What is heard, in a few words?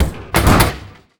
vending machine soda drop; ModernWarfare